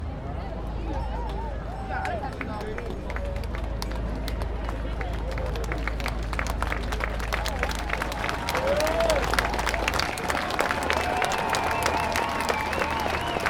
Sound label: slow starting applause